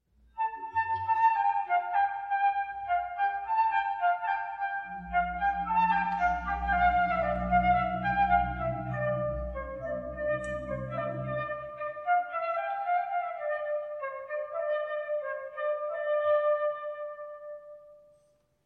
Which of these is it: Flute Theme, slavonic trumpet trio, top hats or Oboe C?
Flute Theme